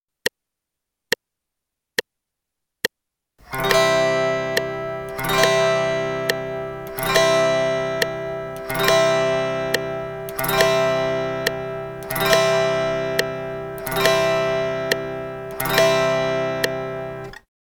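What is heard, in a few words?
strum quarter notes pattern 2 C, guitar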